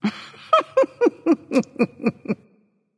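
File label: heh heh, female; smite; voicepack; hera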